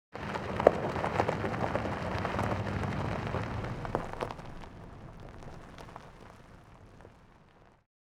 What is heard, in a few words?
Car arriving on gravel wheel noise